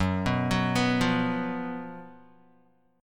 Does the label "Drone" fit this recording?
No